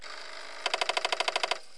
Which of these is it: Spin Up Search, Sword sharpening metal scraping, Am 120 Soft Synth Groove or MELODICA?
Spin Up Search